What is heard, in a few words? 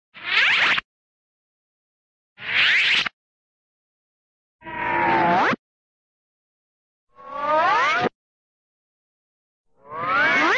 zip, cartoon zip in